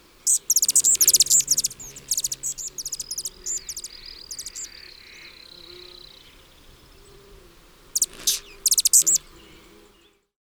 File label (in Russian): kolibri